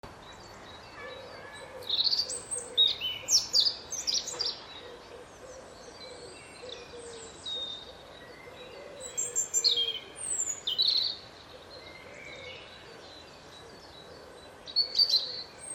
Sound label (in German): Stimme des Rotkehlchens